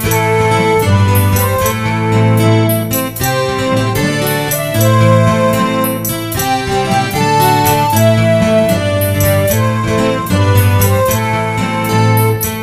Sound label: fill lr